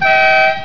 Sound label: train horn; bulletbait